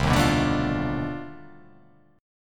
C Minor Major 13th, chord

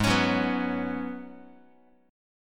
G sharp Minor 11th G sharp Guitar, chord; G#m11 strummed; x,4,4,4,3,x; JGuitar

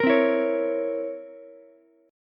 C 7th Flat 9th C, Ukulele; C7b9 strummed; JGuitar

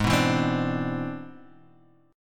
C Augmented Major 9th G sharp, chord

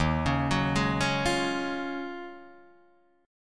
Eb Minor 11th Eb down Guitar Drop D; Ebm11 arpeggiated

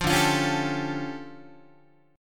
D Minor Major 11th, down, Guitar; 8,10,8,11,11,8; DmM11 strummed; JGuitar

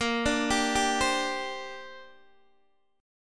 Bb 6th Add 9th Bb Guitar DADGAD

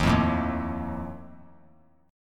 Eb Suspended 2nd Flat 5th Eb 3C 3F 3A 3D; Ebsus2b5 strummed; JGuitar

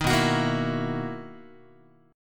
B-Major 11th Guitar, BM11 chord

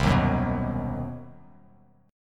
C Minor Major 7th Flat 5th chord, Bass Standard; strummed; JGuitar

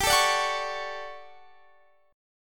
D Major 7th, G; strummed; DM7/G; chord

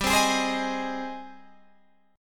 G sharp Major G, guitar chord; strummed

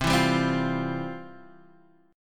F Major 7th Suspended 2nd, chord

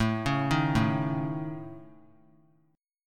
A Major Flat 5th A, chord; AMb5; arpeggiated; JGuitar

